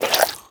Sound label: breath out, headcrab black; creatures